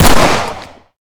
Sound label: fire, glock; Urban-Coalition; Decay; arccw ud